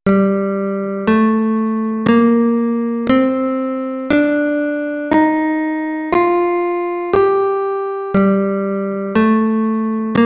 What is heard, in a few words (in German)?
G Tonleitern natuerliches Moll aeolisch